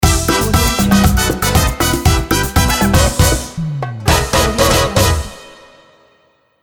Brazilian logo

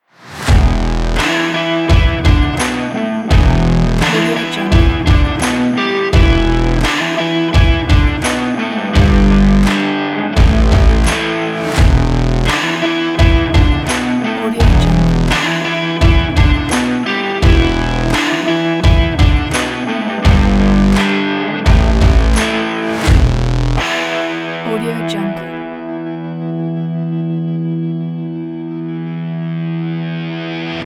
stylish stomp indie rock

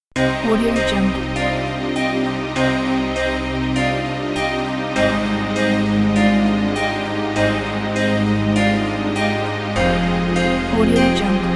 dark theater

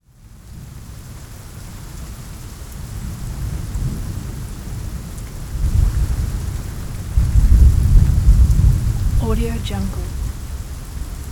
storming

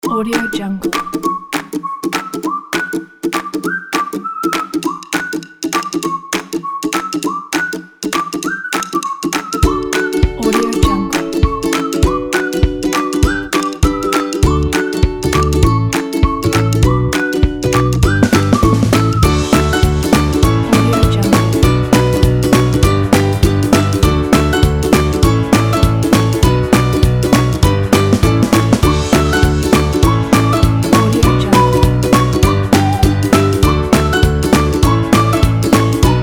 happy

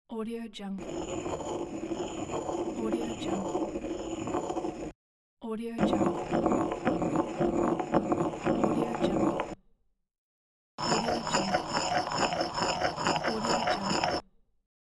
stone mortar; blackriverphonogram

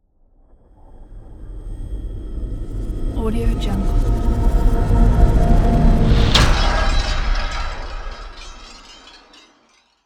rising cinematic transition

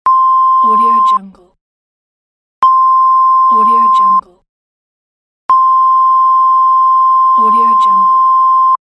censorship beeps

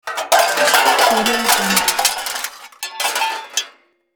searching through dumpster; hollywoodedge

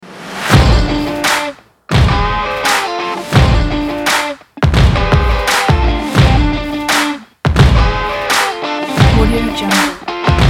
podcast intro

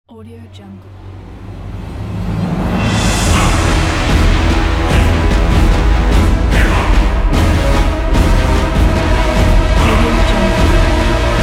dark powerful thriller

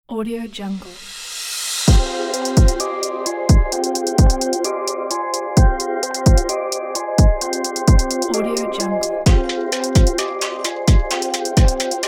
explosive hip hop logo

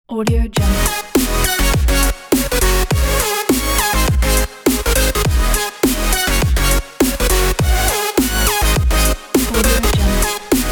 glitch hop background

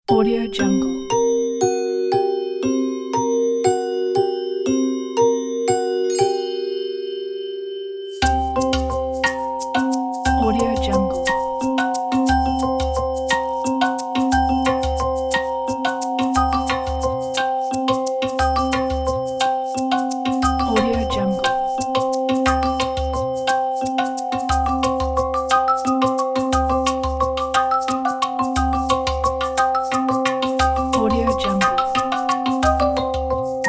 pulling strings

